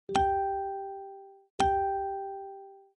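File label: messenger notification alert; facebook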